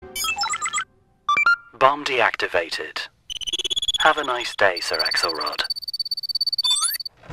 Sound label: bomb deactivated